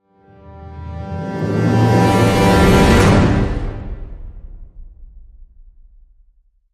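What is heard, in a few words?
Hit Orchestra Brass G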